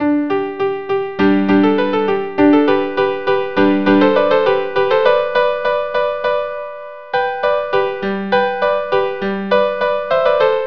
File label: viola duo; La Rejouissance